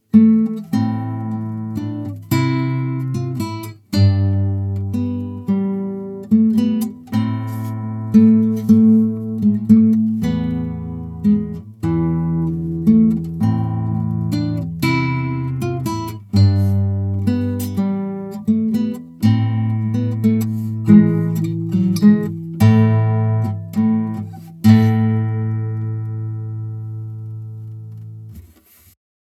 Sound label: iRig Ac iPad